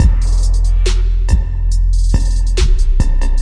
140 bpm, verby hat trill banger, drum loops and breaks; 131 to 140 bpm; samples-ghost